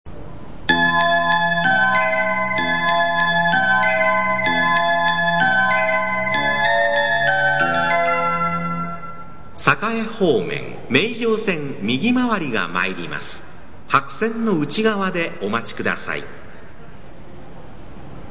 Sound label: clockwise; subway; yabacho meijo